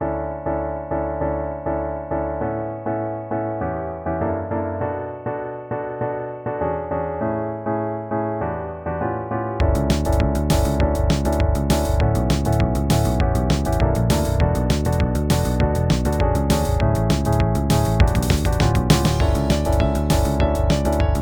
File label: Piano Contour; WarHorse